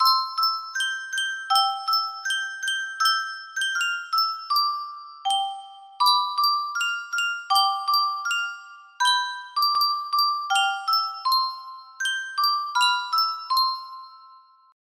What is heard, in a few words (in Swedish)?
yunsheng speldosa, music box melody; mamma mu